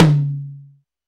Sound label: tom hi; Drums; Ableton Foundation FreePacks